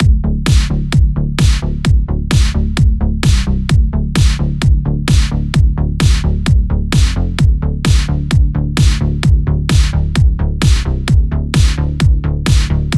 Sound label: instrumental